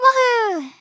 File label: Wahoo; Teto; SM64 CO-OP; Triple Baka Pack